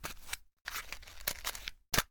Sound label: matchstick takeout